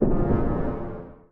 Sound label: Rebloom Drum; Musician